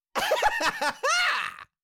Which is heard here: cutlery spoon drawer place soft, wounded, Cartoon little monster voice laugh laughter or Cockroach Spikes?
Cartoon little monster voice laugh laughter